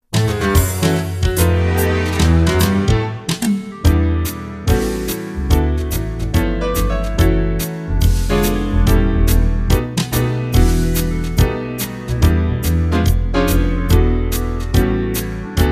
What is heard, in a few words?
ACCOMP short in D